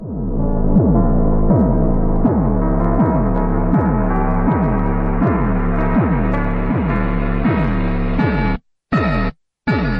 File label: mega slowed